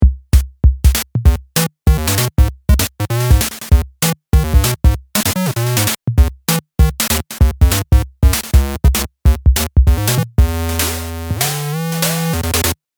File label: Drum Bass